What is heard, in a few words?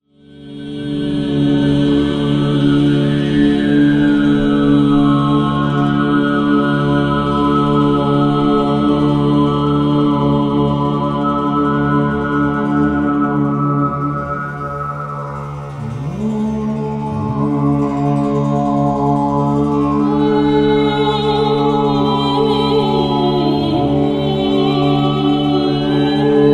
overtone